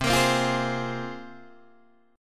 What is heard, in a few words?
C 7th Suspended 4th Sharp 5th, Guitar, Open D